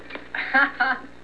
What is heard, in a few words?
laugh